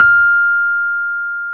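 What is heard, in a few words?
Piano Rhodes; Electrics; Club-50 - Foundations Roland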